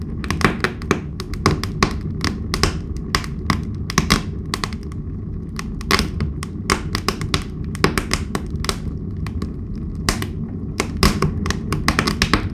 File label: fire mix; best service Blue Box